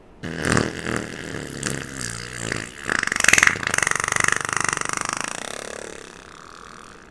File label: fart; dried apricot